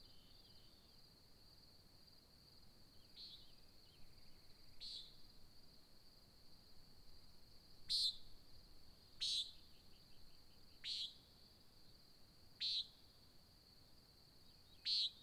Nighthawk Dawn Nighthawk Competition, American Desert Birds; 2017 Sutton July